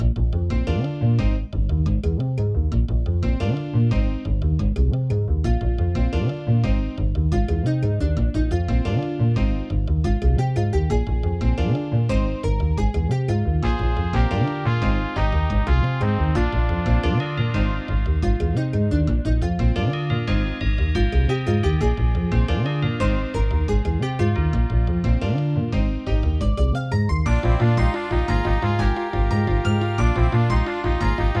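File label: level1, Guillemot MaxiSound 64 Home Studio Pro; soundcard test; Hocus Pocus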